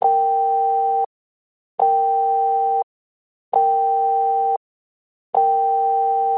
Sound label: Sepura call